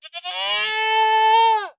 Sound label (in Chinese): 山羊尖叫声